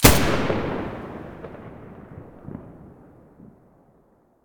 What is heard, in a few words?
muzzle g36 stereo, weapons